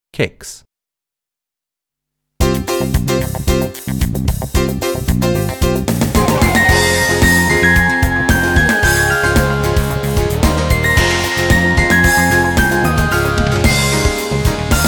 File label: Kicks, modern; grade 5 modern